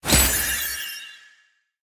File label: key landed; codex of fortune